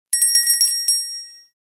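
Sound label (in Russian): колокольчик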